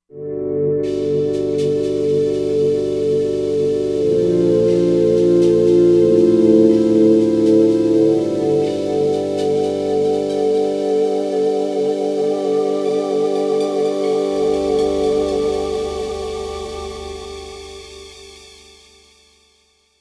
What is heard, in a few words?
Follow, Roland Fantom XR